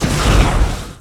Flame Thrower Shot Burst, Weapons

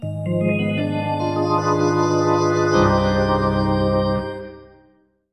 Hockey Organ

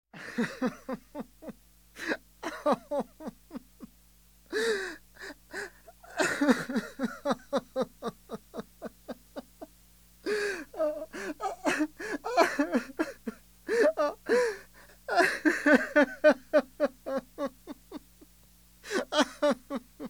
Man Crying; KMP Film Video Sound Effects

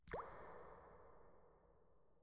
Water Drops Single; Inquisitor 2

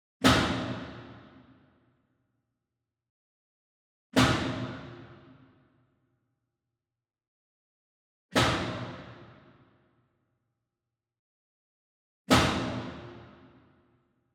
Sweetener Metal Bin Hard Hit Far; boom library; Cinematic Strikes Construction Kit

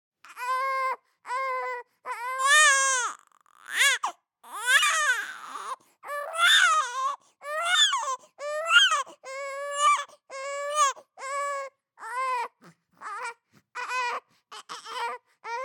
BABIES SCREAM Boy 3 months Dynamic; Baby Boom

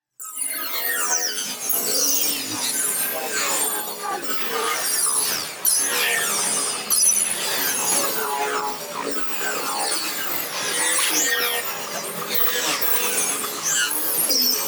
PARTICLES SMALL SLOW; Light Show; boom library; Neon

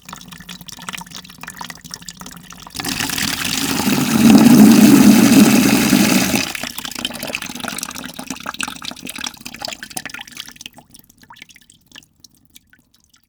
water filling; best service Blue Box; water fx

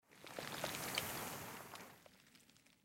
Ground; Blind Quest - The Frost Demon

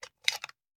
Machine Gun Foley; Sound Ideas; Guns

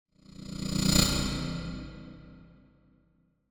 Whoosh Stutter Fast Pulsate Fear Pass; Hollywood Edge; sound designer's toolkit v2

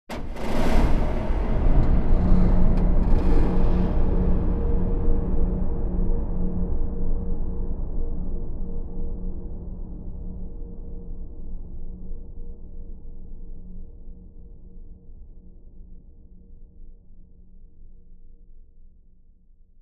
Creak Moan Distant Long Abstract Creaks; Hollywood Edge; sound designer's toolkit v2

